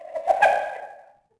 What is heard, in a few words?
h laugh; creatures; Star Wars Obi-Wan; XBOX